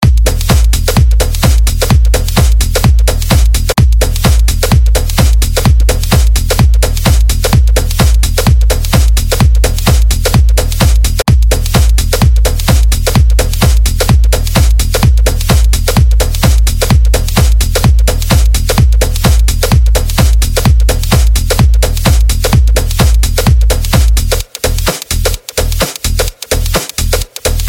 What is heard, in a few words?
Clubing; Vanilla